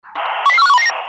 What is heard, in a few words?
Police radio scanner